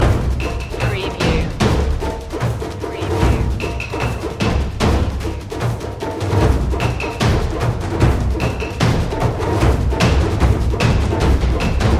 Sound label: taikos loop